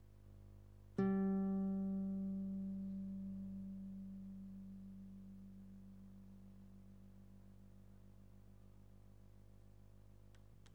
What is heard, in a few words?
guitar